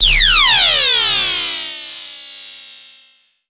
Landing

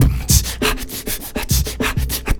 beatbox loop

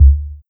Tom Low; Anarkya

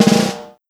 Snare Alt Roll; Millo V3

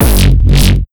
Kick Dist Bass FX; Granular Sumo

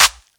Clap; pitchglitch samples; DrumDigger

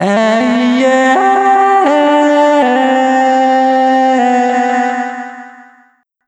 Vocal Yeah Hi Nehh

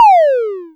Sound FX 8 Bit Down

